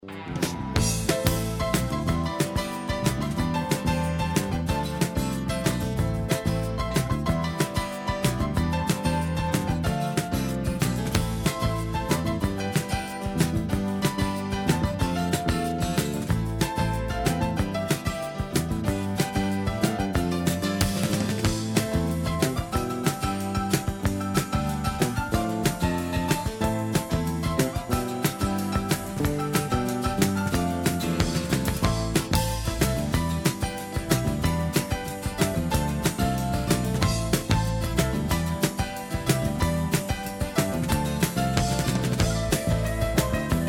solo

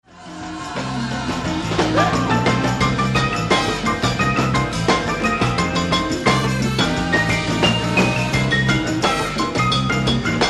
Piano Solo

